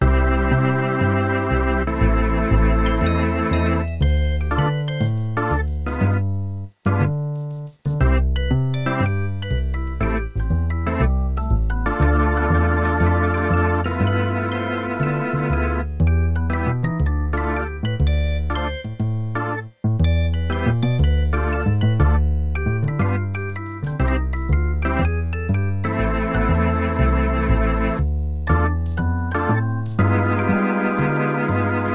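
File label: jazz organ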